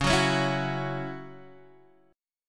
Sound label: C sharp Minor Double Flat 5th C sharp Guitar Open D